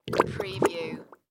Mud Bubbles

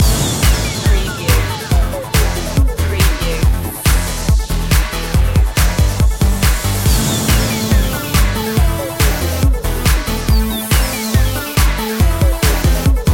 tv intense loop

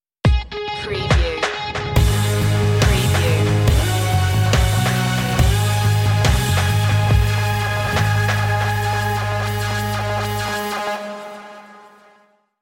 dub metal stinger

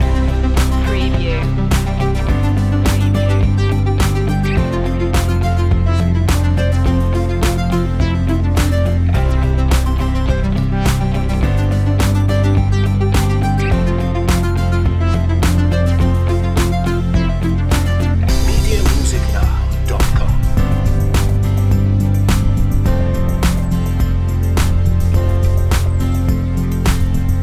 motivational song loop

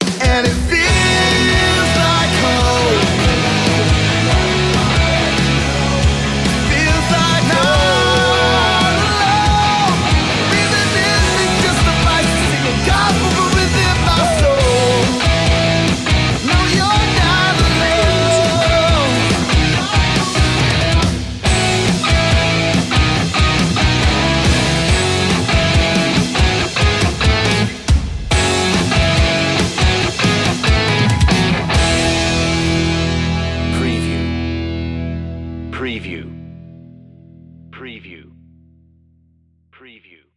the race vocal

